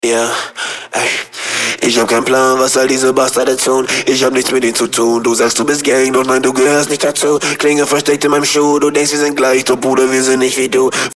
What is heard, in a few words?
autotune inertia 0; Rap Vocals